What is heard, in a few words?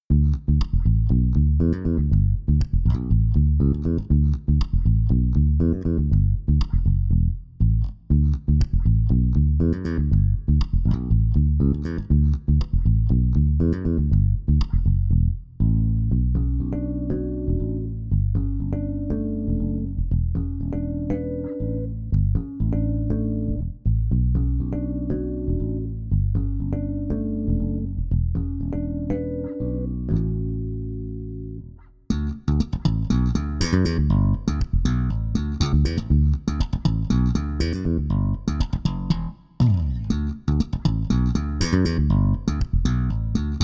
bass; ManyBass